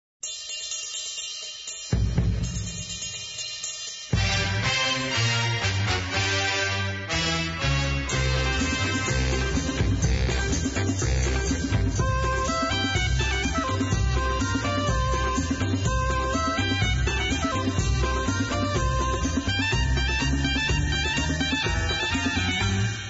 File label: swingin' cymbal